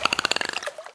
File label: squak; World Of Goo; Fish